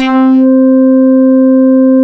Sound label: Syn C5, Fairlight CMI IIe Keyboards; Keyboards2